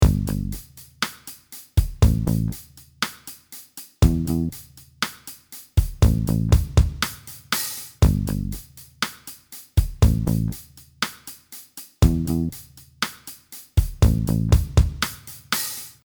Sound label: Sidestick, snare drum